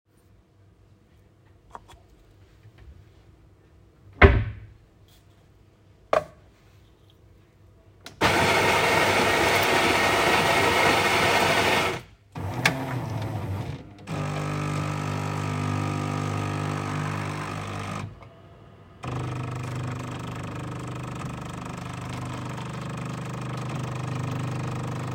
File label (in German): Der Sound der Kaffeemaschine